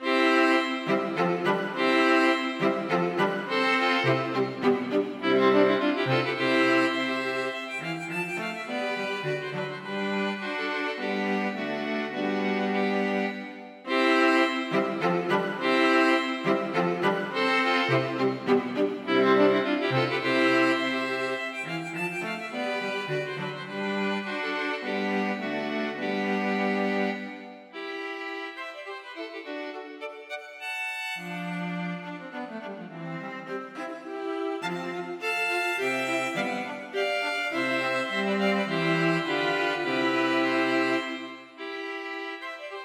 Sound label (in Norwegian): Menuetto for strykekvartett; Collett